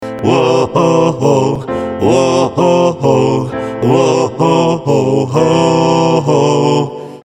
hauptstimme; Verdopple die aktuelle Stimme

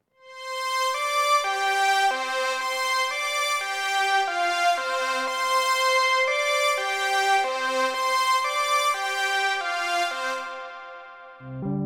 padartig pioneer toraiz as-1

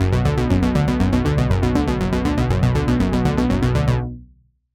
arpeggiator laufrichtung up down

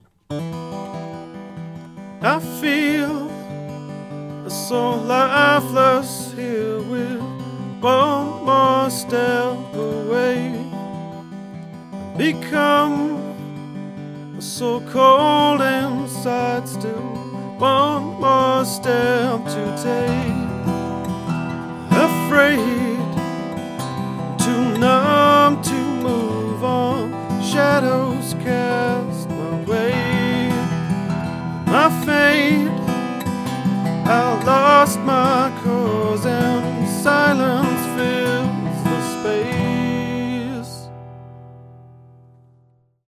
sennheiser mk 4 digital -20cm onaxis gerade

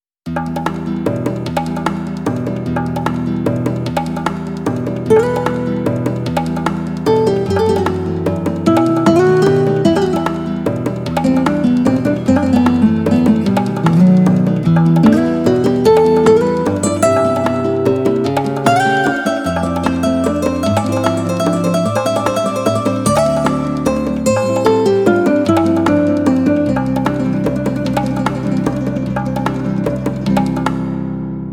acoustic track mit hall

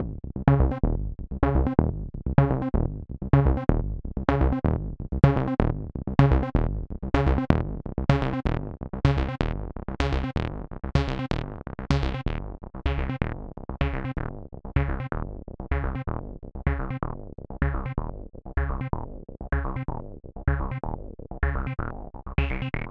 Roland TB-3 Sequenz

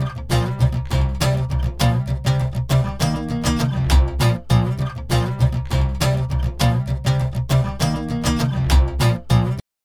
gitarre dry; softube summit audio grand channel

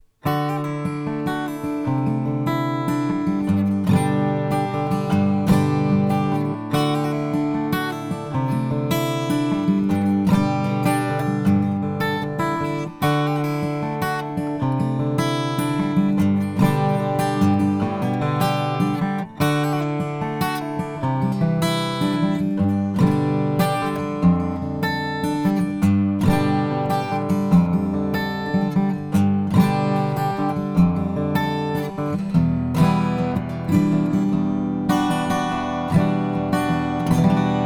gitarre; chandler limited rs124

